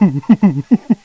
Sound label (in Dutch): oehoeh